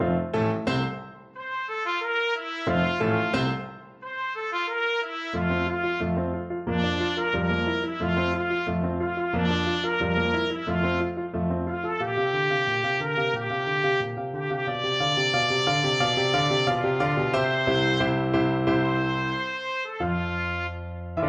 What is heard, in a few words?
doch du bist trumpet